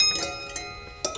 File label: Prepared Piano; Battery 3 Sample Kits